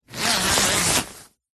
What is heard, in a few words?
clothing high vis jacket thick zipper; zapsplat